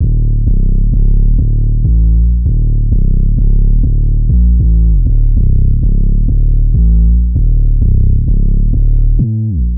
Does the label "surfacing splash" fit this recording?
No